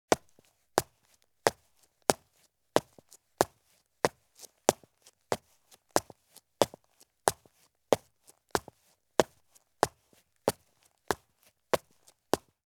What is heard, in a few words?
Men's Light Business Shoes Take Slow Steps on Concrete Stairs; Sound Ideas; Footstep Sound Effects